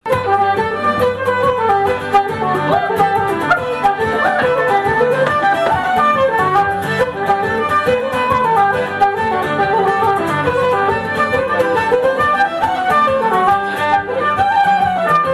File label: Aherlow Jig